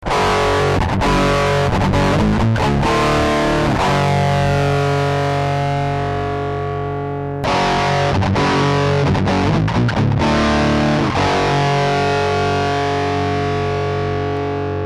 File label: mu dist free spks